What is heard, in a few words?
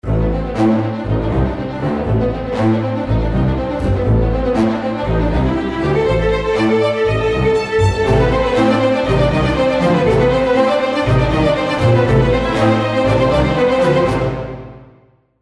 action perp mobile and riff